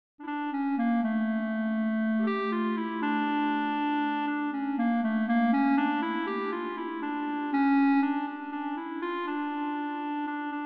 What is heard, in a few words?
melodica bella ciao